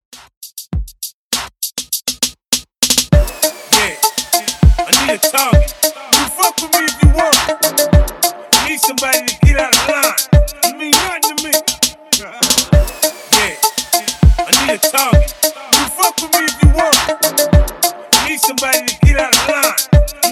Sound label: Raw Club Hip Hop Dope 100 BPM